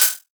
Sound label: Open Hat; Heater; Cymatics; Future Bass Starter Pack; Drums - One Shots; Cymbals